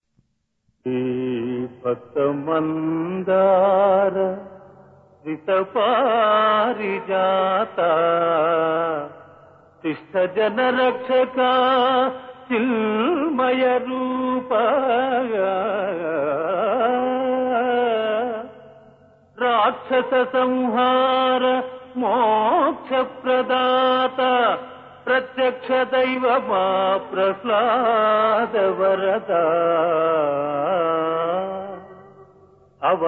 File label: Padyam; RenukadeviMahatmyam(1960)